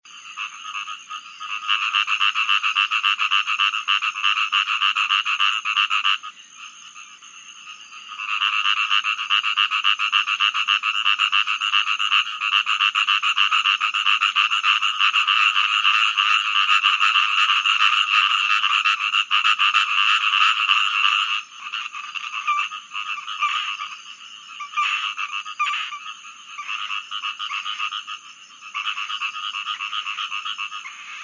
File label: rice field frog